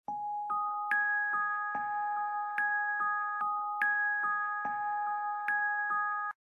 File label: Samsung Galaxy Music Duos Sms